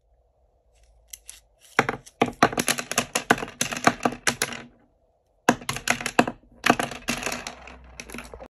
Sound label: Dropping pencil; افتادن مداد